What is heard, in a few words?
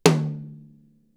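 mid tom rimshot; 90s sample CD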